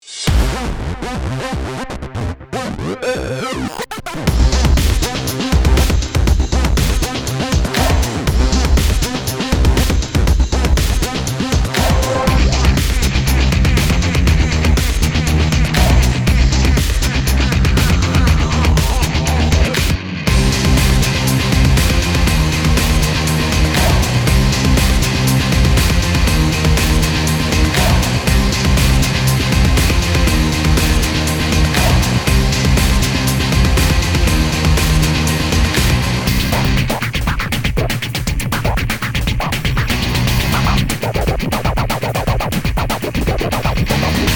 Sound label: Electro